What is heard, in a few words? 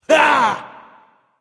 yay; smite; terra